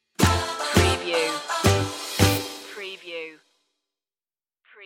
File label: stinger